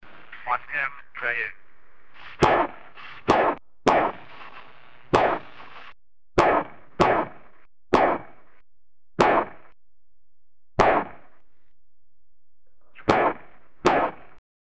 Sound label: one guy is taking gun, anticipation